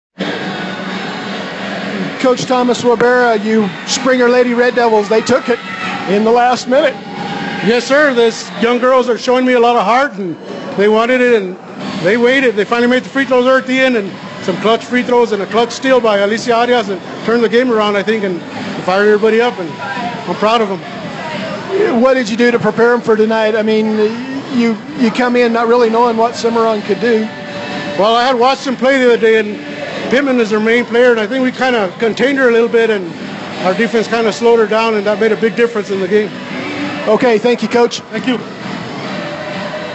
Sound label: Cowbell; Springer 2nd Place